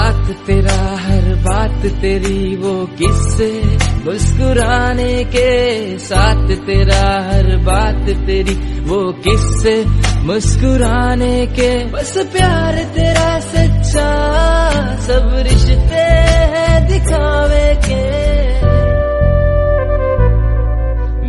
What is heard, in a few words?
Brother Birthday Song